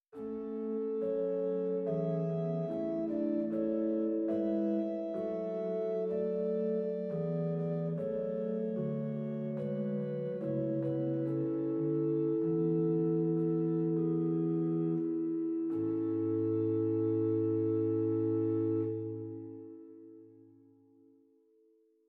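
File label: Bovenpositief Holpyp 8, organ; zwolle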